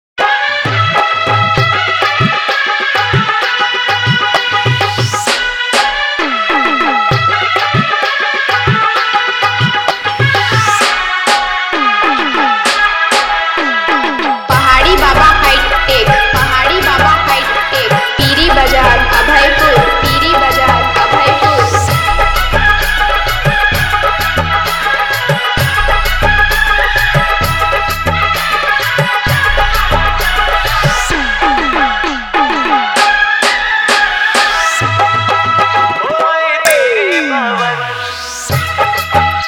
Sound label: Dj Remix Navratri Song Hard Dholki; Drum JBL Bas